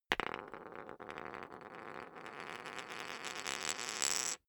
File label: poker chip spin long